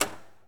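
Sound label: Ferrari Testarossa Trunk Open, Automobiles; SFX Kit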